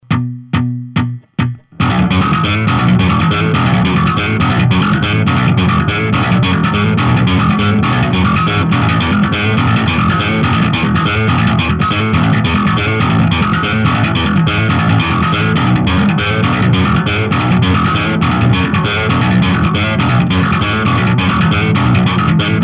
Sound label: New Riff